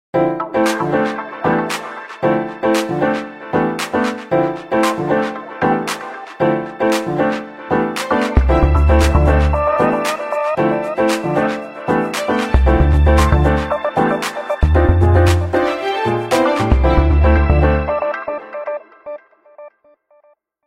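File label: original ringtones and notifications nokia 808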